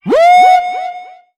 woo

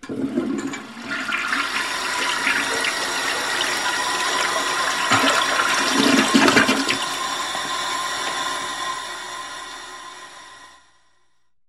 toilet flush

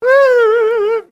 "woo" fruity male, voice; ooh ahh; ho hoo woo

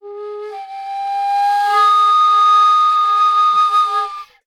flute, Loops, Sad

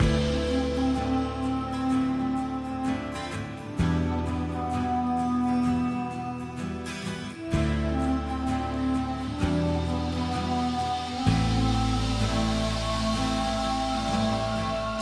flute loop, Sad

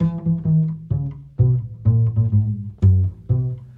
bass, Loops Phonky

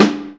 Snares, Real

